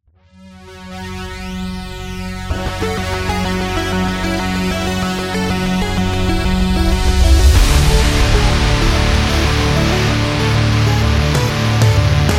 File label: Sport Fast Energetic Background